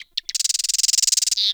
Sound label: Forest Bird Squak; Warframe; Grineer Forest